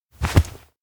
shoulder pat strong; anemoi

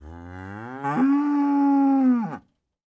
cow voice; anemoi

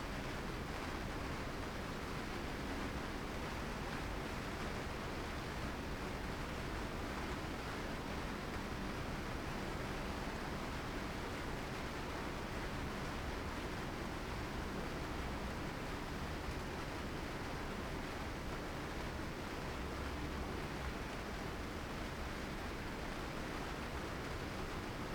indoor postman rain; anemoi

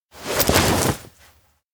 bag drop; koyomi; anemoi パッケージ版

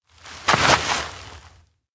take start position; anemoi

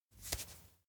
hold hand soft; awayuki

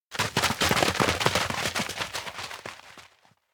steps outdoor ground kids dash; anemoi

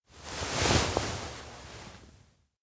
turn back; anemoi

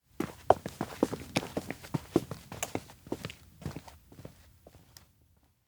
steps outdoor walk; awayuki; anemoi

